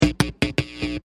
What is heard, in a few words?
Electric Buzz Crackle Bursts, Several bursts of short e; Several bursts of short electric buzzing/humming; Electrical Sound Effects; LynneMusic